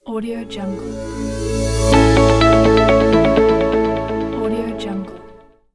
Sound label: simple sound logo